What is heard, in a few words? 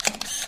camera; pano garden